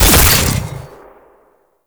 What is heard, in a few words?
Alt Shot; Weapons; Warframe; Fulmin Prime